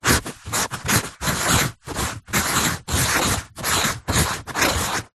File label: office marker pen draw shapes on tissue paper